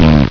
RASBERRY

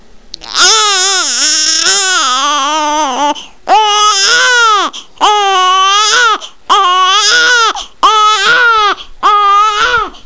CRY; PEOPLE

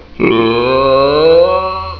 robot; Gloom3 rejects

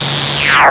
PLASMA; HIGHTECH

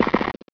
step stop

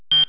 BEEP

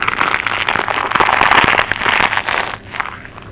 CRUNCH, HOUSE

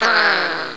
GROW

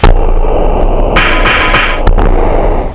MACHINRY

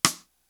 RIM

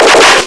CANNON, WAR

